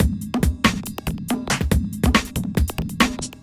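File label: industrial 140 kit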